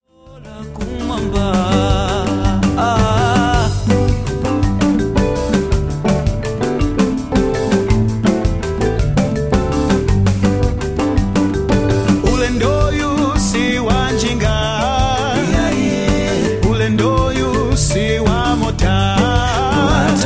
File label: njiro yopita kumwamba, african gospel